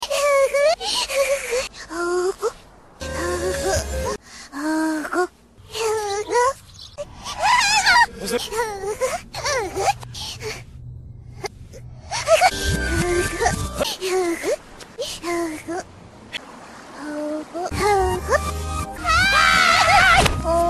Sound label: uguu; reverse